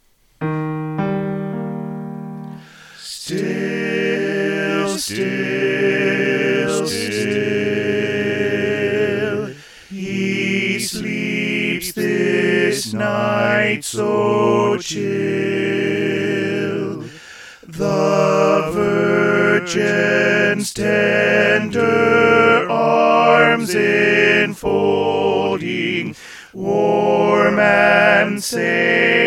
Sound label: Tenor